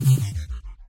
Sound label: Cursor Ping